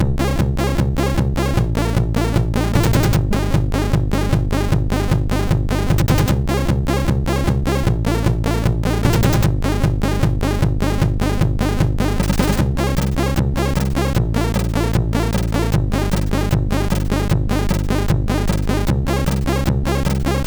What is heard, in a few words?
Undead Main Theme, MOS6581R2; sid